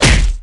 punch boxing face hit; survivor

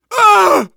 hurt critical, survivor voice

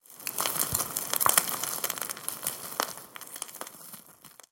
post grenade dirt debris; physics, explosion

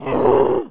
zombie female growl; witch; npc

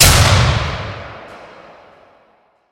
arena lights off; atmosphere

